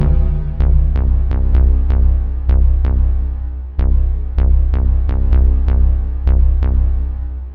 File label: techno deep bass reverb; instruments; loops